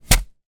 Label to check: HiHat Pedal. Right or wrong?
Wrong